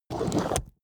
plastic slide small

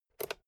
fader slide click quick

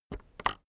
keyboard external enter press slow soft

